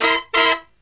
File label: SLEEP, Killer Brass Riffs